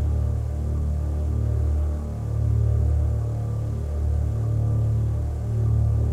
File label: Core Chair; MiSide